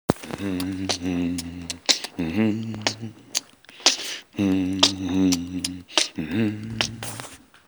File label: new riff